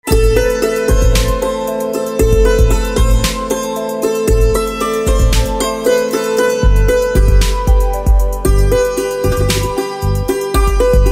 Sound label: Santoor, instrumental ringtones